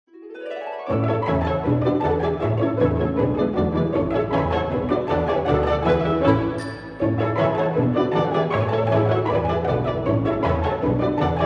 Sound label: Classic TV Happy Family